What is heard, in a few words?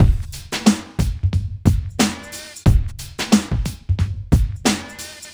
Street Tip, R&B Drums